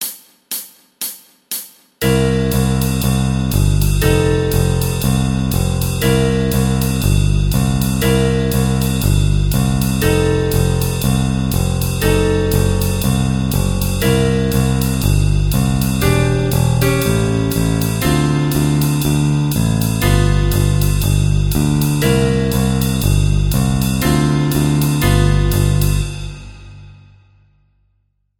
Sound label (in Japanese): ブルース 12小節 4分音符のみ ベース ピアノ ジャズドラム